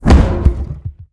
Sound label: damage; World of Tanks